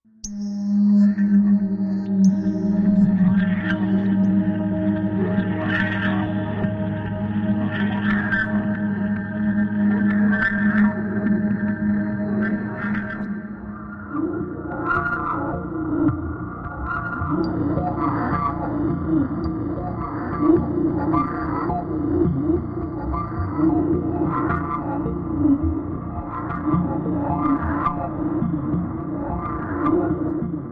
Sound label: Mass Confusion Shrill Voice Tone Swirl in Vortex